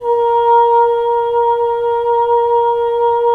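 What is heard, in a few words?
wind bassoon; LA Composer vol. 3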